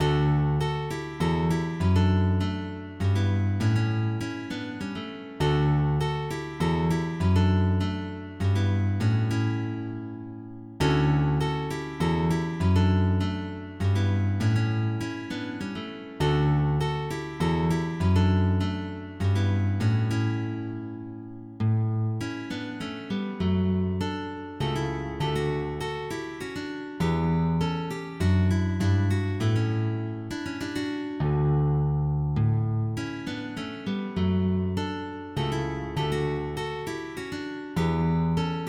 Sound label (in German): Menuet D dur